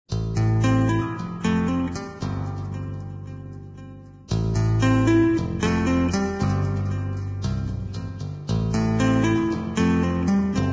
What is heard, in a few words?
Guitar Electric Bass